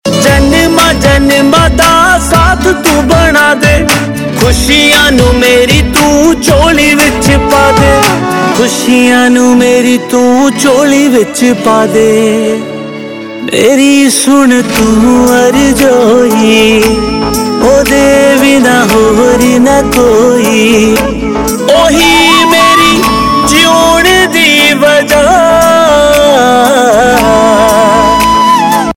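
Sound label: Ringtone, Punjabi Pop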